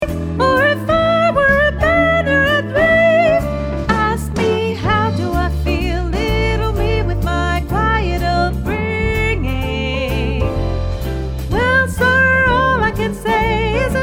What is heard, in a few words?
Soprano, ensemble vocal jazz; ensemble vocal jazz de larquemuse